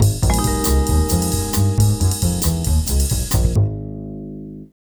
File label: TV Suspense Full G#, Lounge 135; Zero-G Kocktail Kollection; Construction Kitz (Lounge); TV Suspense Mixes 135